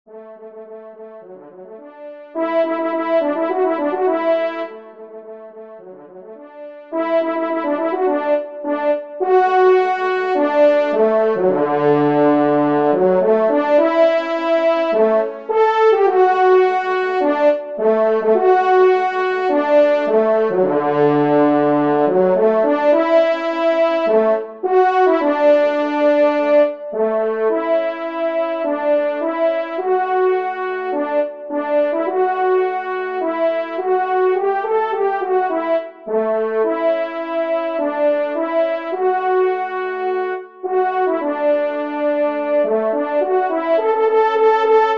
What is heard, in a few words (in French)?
trompe; Souvenir de Beauvais; 1e Trompe